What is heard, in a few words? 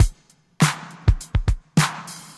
clap along, Drum loops, Boombap